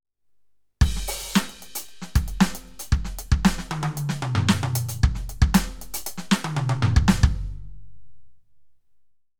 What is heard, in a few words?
Roland TD07 Resonance